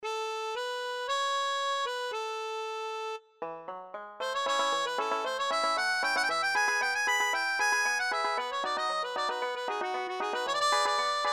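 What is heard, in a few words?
Little country improvisation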